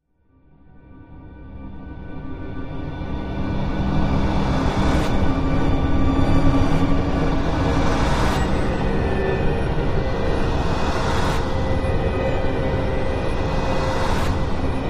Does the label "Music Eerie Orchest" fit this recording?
Yes